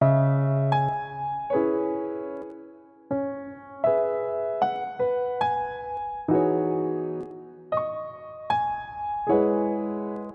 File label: Jazz Piano Ballads